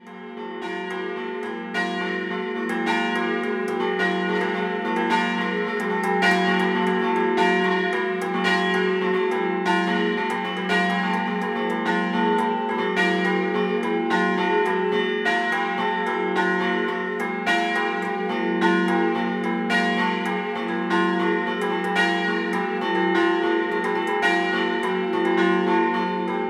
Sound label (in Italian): Scampanottata